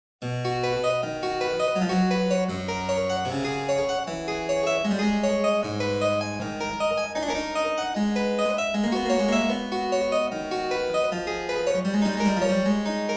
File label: Mietke Praeludium c dur; Bach